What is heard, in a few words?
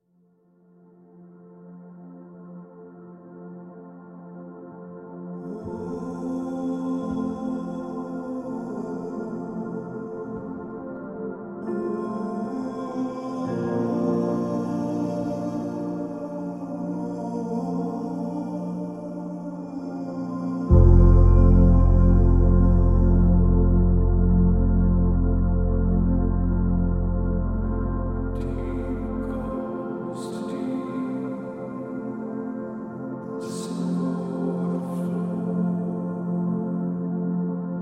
Psalm Instrumental